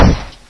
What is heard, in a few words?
Gen Hit M; 暴力赛车